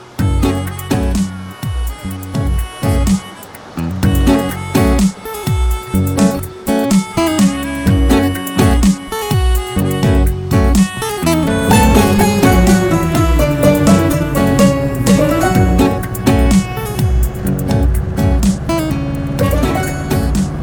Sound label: Get Married BGM; Adam Joan; Malayalam